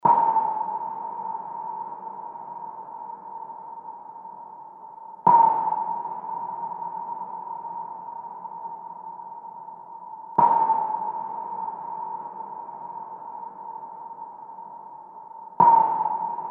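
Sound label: sonar low frequency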